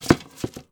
arrow return to quiver, Medieval Weapons Sound Effects